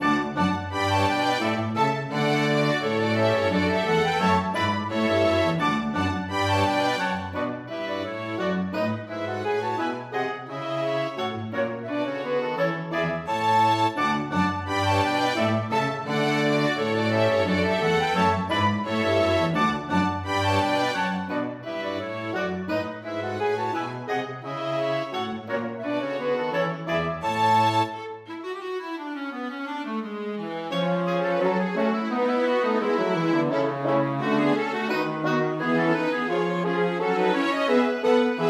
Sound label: Gavotte small orchestra